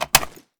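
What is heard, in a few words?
sniper reload empty mag out; gmod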